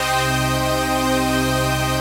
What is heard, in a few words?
CHRD PAD; Best Service; XX Large Pads; Sample CDs